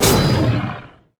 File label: Magma Gladiator attack; IgnisVolcano